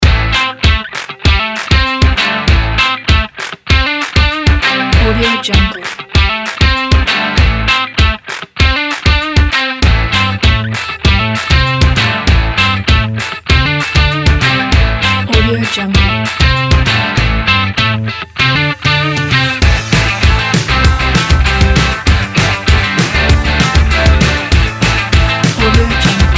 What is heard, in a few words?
happy rock